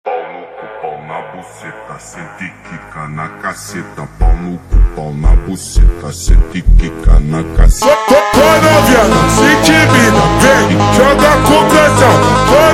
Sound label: Funk slowed